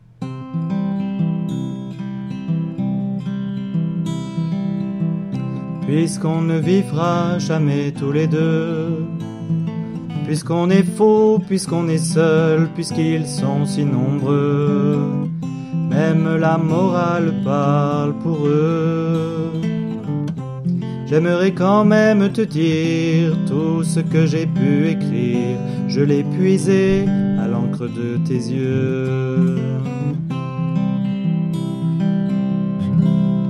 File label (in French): Alto